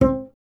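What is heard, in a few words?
string contrabass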